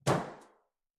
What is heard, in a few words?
Metal Footfall; soundscrate